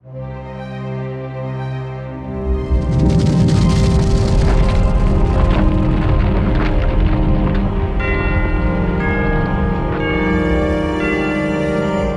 Door Open; J.U.L.I.A.; Windows gamerip 2012

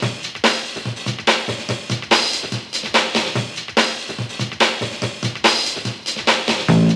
FIGHT Fade; bully scholarship edition

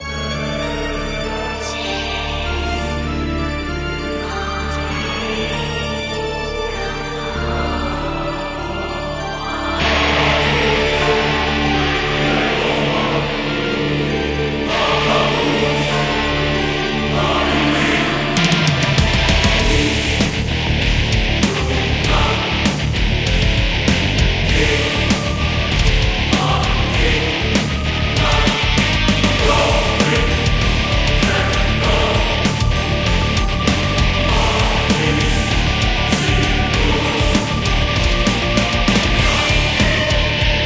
bgm rain fight; Naruto Ultimate Storm; android; StudioDOMA Kihan

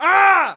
Male Pain

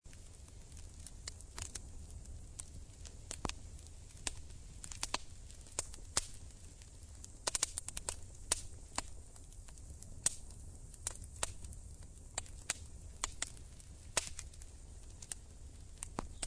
ambient camp

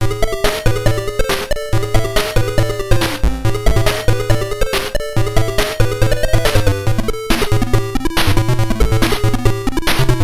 (PSG Version); Hydefos

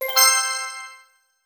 Jingle Get Item; StreetPass Mii Plaza; Slot Car Rivals

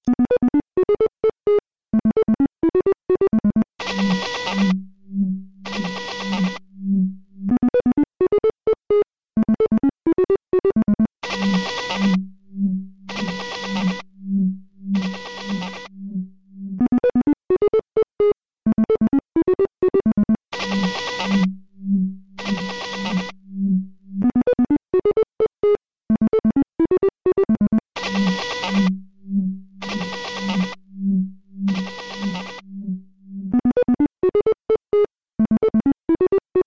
Bomber Techno; bomberman hero

